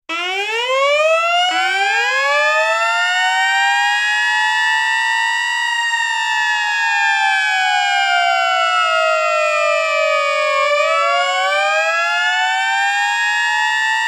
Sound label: dual mechanical, siren; sa441M